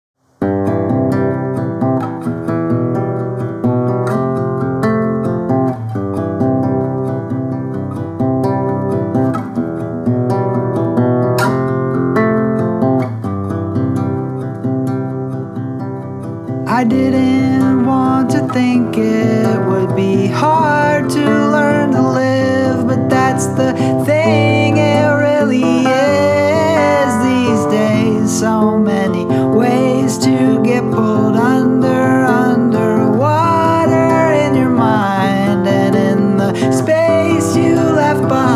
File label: Acoustic